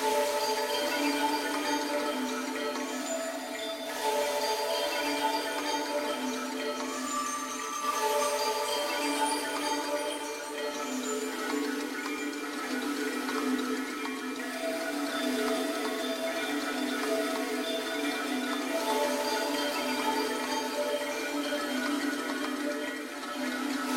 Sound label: chill, music; sven; digilab